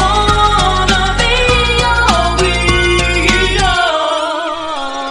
add a female vocal pop melody electronic and playful long held vocal notes